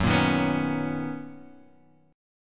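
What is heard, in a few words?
B Major 9th G flat, chord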